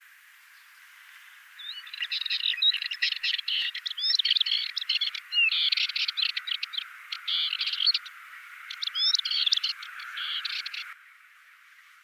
fauvette pitchou

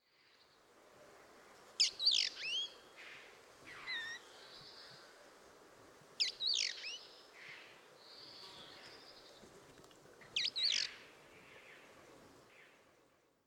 tichodrome echelette

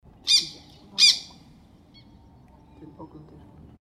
guifette noire

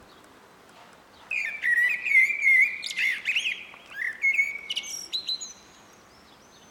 merle noir

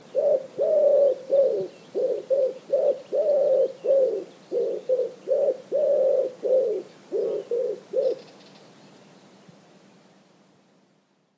pigeon ramier

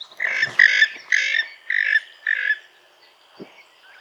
orn taleve sultane

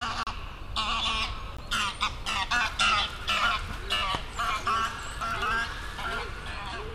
oie cendree

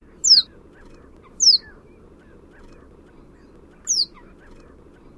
bruant des roseaux

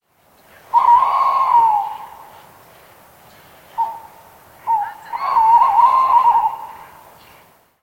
chouette hulotte

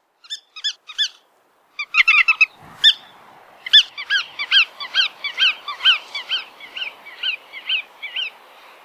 barge rousse

